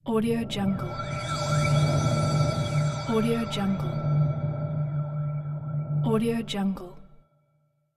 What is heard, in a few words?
space